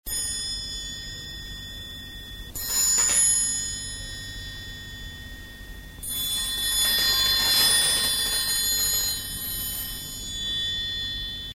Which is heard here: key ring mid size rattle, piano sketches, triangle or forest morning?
triangle